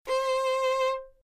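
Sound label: violin si; violon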